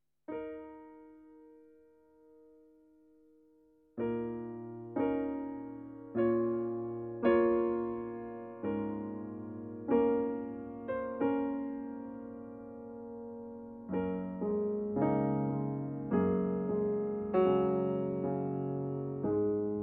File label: solo piano